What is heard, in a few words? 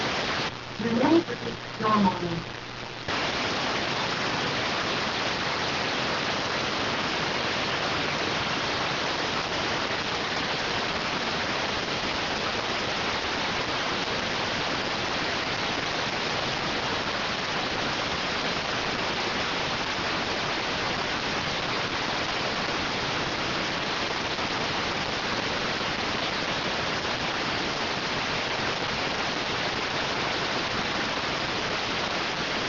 rtty rx